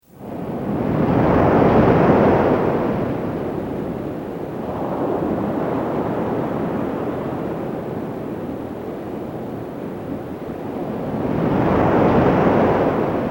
Ocean Waves Ambience; starfox

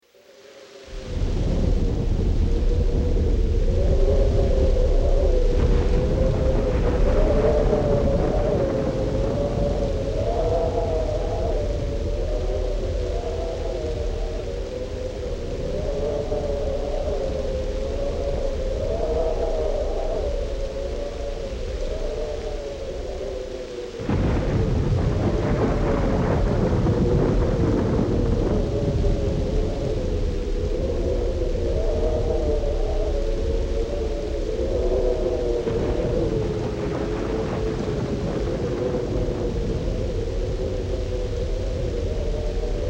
Heavy Wind and Thunderstorm Ambience; starfox

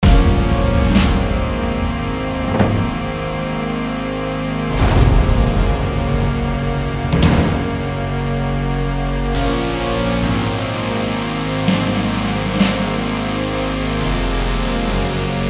Synthwave

